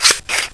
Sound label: spear trap; Game Maker 8